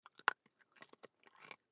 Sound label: Human Bite Food; Bite Food Vitamin; Eating; Blastwave